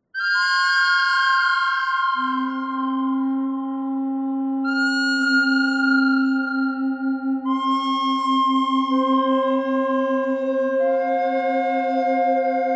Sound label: bird thread harmonic; noreverb